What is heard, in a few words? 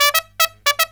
TRUMP, Killer Brass Riffs